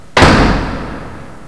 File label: Close Door; psion cd vol1